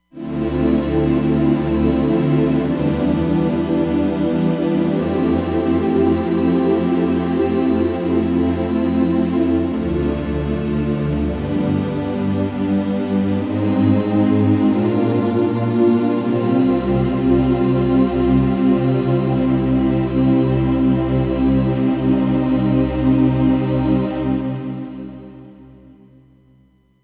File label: Gospel Oohs, Roland Fantom XR